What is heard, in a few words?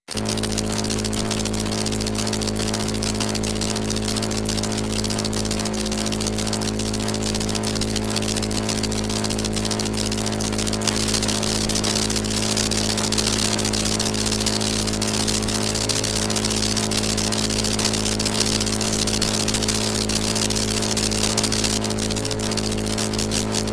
ground electric arc machine; sonniss gdc